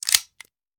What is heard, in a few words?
Hand Gun Foley; Sound Ideas; Guns